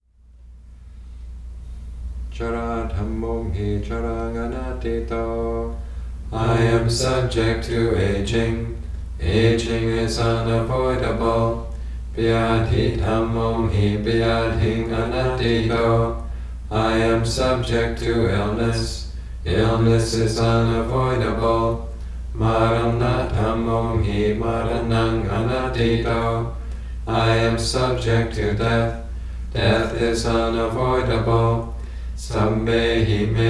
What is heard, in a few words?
Chant Five Recollections